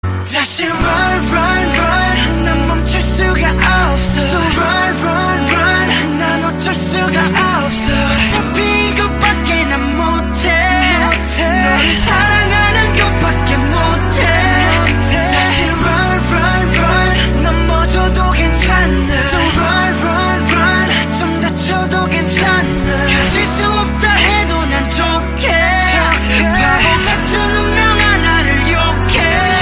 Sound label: Ballad Mix